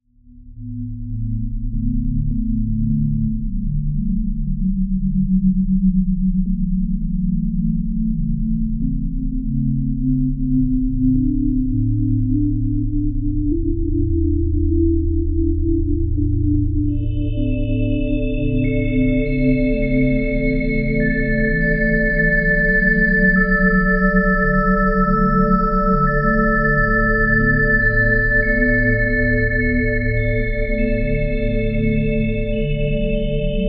Ambient Loop; Drox Operative 2

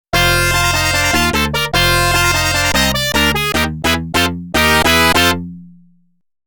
RACE START; Taito Digital Sound Archives Arcade Vol.2

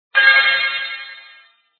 prize sound

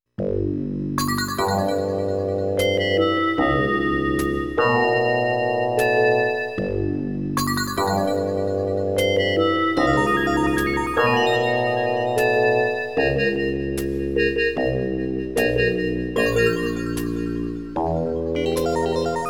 Silent Alarm; Metallic Dungeon; Lagrange Point

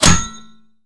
Bullet Impact Metal Heavy; jasem just another shooter with electronic music windows 2017

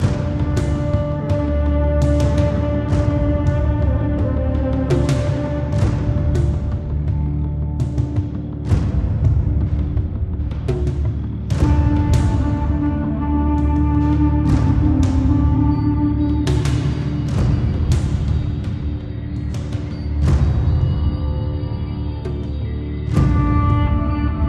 Menu Music

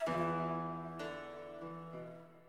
Puzzle Start; City Of Light; prince of persia 2008 gamerip

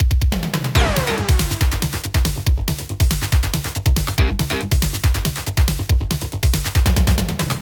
Alert Mode Intro

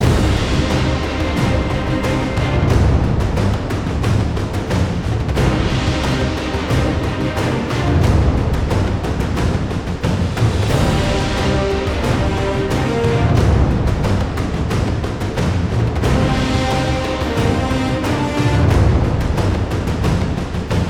Combat Percussive

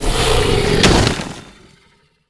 cretaceous pass out

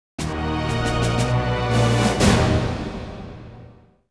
Bonus; Tunnel B1

